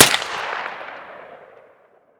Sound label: vss fire; weapons